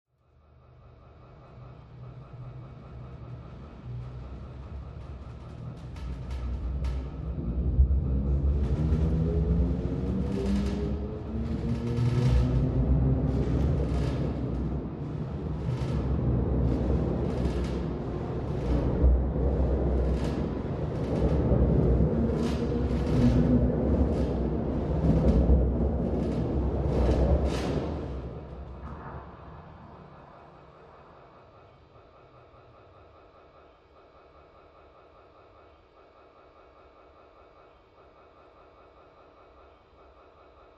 Train Pass Over Tunnel

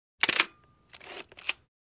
Hassleblad Shutter; shutters & movement

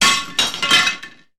Glass Drops Rattles; clay & ceramic

